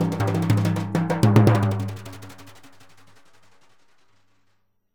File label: TIMB BR FIL, Synclavier Percussion; Synclavier Percussion 2; World & Orchestral; ILIO